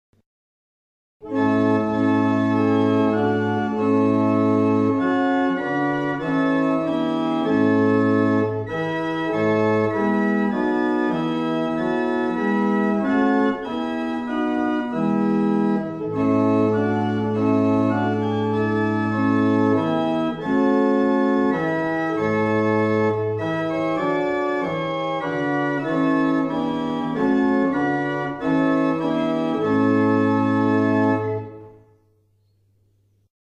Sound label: Wareham, barrel organs; Mattishall